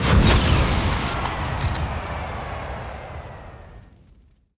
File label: nuke; World Of Goo